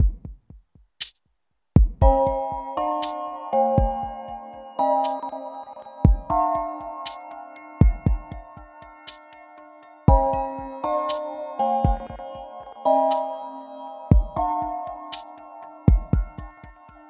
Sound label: Elevator Theme; 1F-10F; splatoon